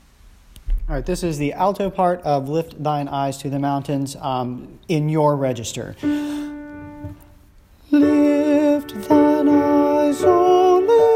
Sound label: alto; lift thine eyes